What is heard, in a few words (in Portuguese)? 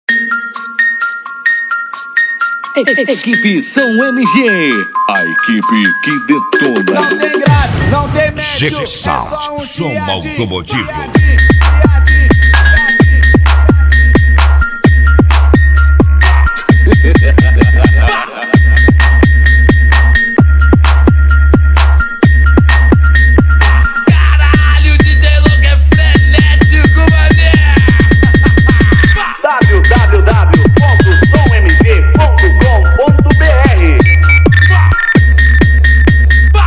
som automotivo